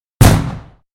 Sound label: Fireworks, Thunderflash; Backgrounds; BBC